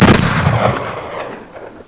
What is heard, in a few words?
44 MAGNUM, Gun shots